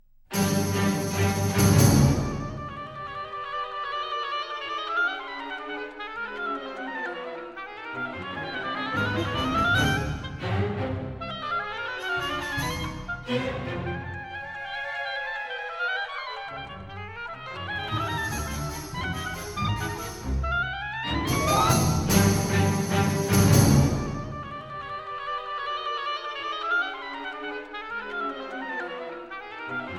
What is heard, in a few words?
Oboe Concerto, Allegro Molto; Strauss, Richard